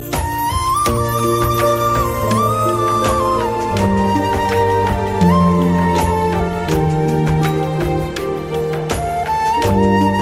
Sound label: flute magic ringtone